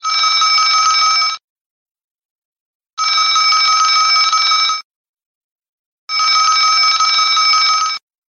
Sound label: old phone bell ringtone